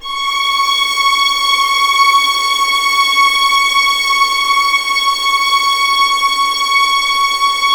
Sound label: C#6, String Collection; East West; The Ultimate String Collection